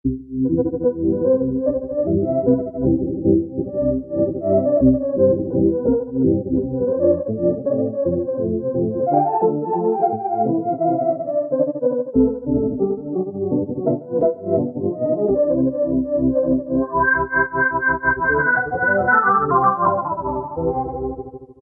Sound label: Pump Organ, Korg microKORG; Factory Voices